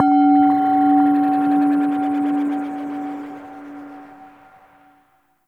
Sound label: Temple Mid Bells, Corpus Ship ambience; Temple One